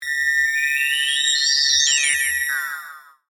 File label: UFO Weapon Beam Ray, Scifi, Ufo, Weapon, Beam, Ray, Vintage, On, Off, Science, Fiction; Spacecraft Sound Effects; BlastWave